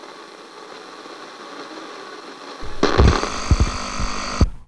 Sfx Radio Got To Right Station

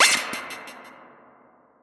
Teleport

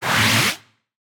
Spawning

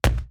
Hit

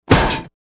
Car Impact Hit Shatter No Glass

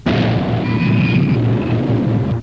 Rollercoaster Scream

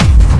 Hard Drum Kick

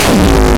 Bass; Dr Ruiner

